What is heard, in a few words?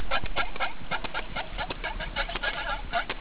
trumpet, whale songs